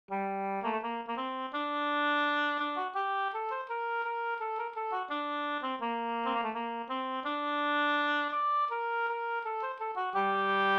ganglat; Min levnadsafton e Hjort-Anders

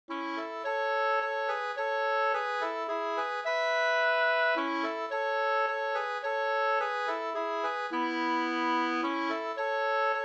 ganglat Norsk marsch 2st

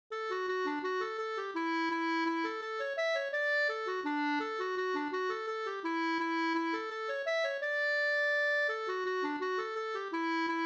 vals

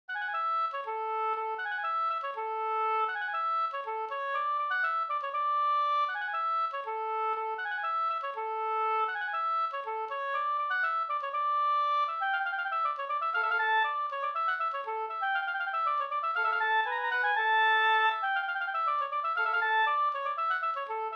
polska Bingsjopolska; e Pekkos Olle nr3

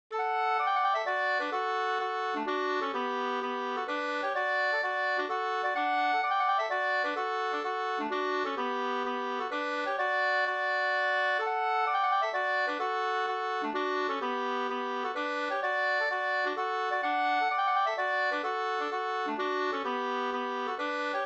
polska Bodapolska

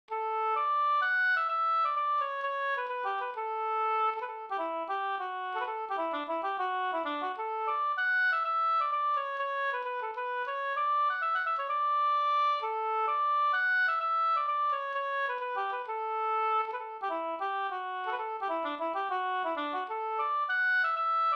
polska Systerpolska; Orsa Da

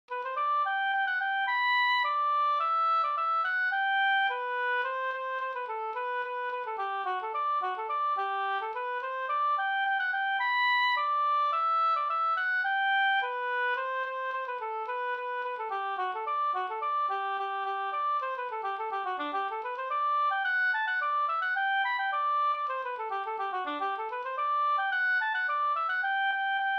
polka polkett; fr Stugun Jm 2-2

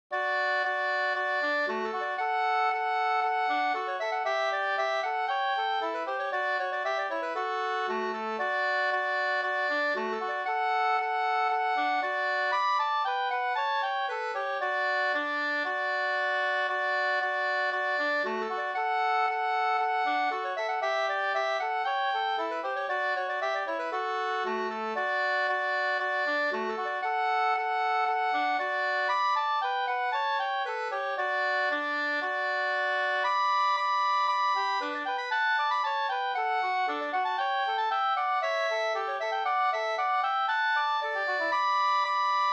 ganglat; Storsudrets ganglat av Edvin Jakobsson

